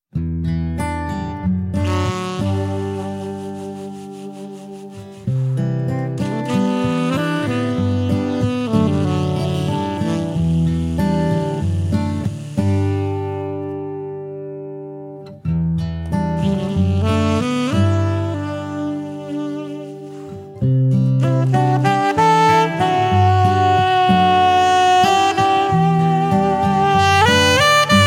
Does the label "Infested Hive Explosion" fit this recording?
No